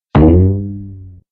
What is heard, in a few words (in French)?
PLATEAU; MOBICLIC161